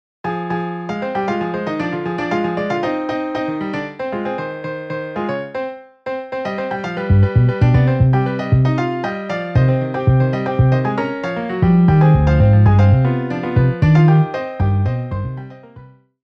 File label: Piano Bass MIDI